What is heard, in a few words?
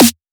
Snare very short